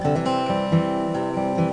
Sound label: guit c